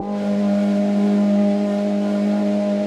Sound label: Lancia Engine